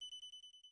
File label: tripmine move